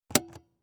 vehicle cigarette lighter socket pops up; hollywoodedge

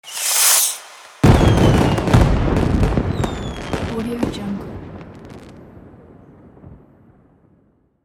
firework

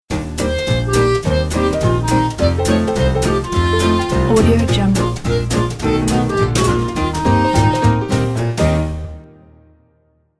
retro swing logo; logotunes

